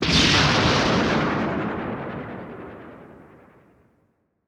warm effect

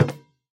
Basketball Pass; Doodle Jump 2

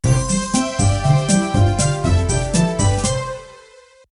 win

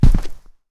Footsteps Rug; Backrooms 2D

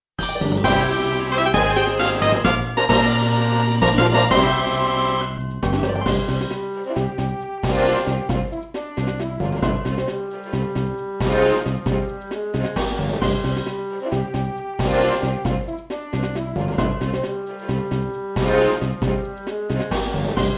Victory Fanfare

